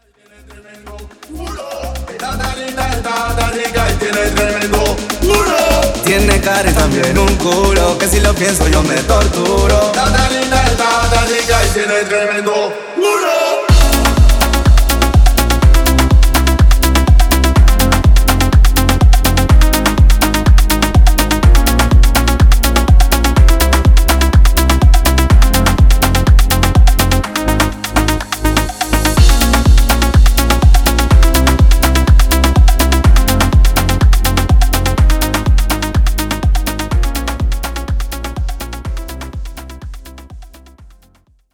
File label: afro remix 124 bpm